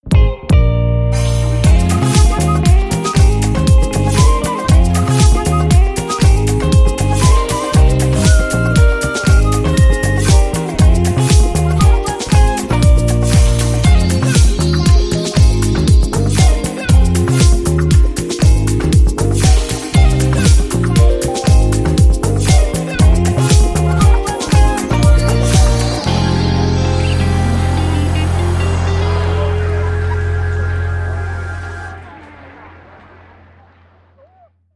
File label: Playing On My Flute